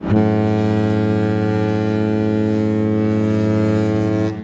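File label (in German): schiffshorn, kreuzfahrtschiff; mein schiff 1; klingelton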